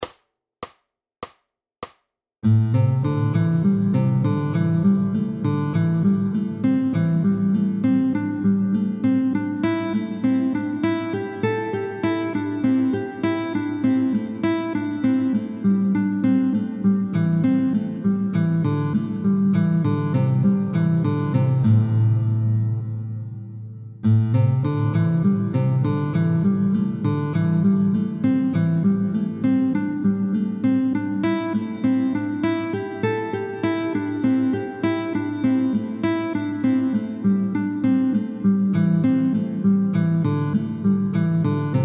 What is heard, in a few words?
Guitar Gym Sequencing oefening